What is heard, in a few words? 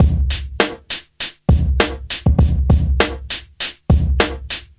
100 head bop super compressor, drum loops and breaks; 091 to 100 bpm